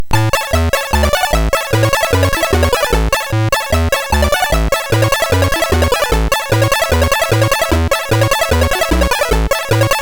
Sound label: Cripple Creek, MOS6581R3; sid; hvsc